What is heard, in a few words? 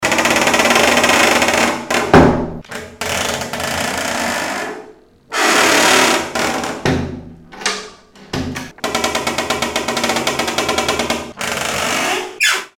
door squeal